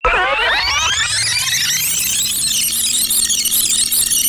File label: Rewind Of A Magnetic Tape